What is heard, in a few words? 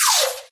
imp bullet generic titanshield; Titanfall 2